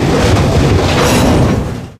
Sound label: coaster stop; loud; Left 4 Dead 2; Source Engine